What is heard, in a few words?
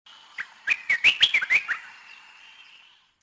bird; yavin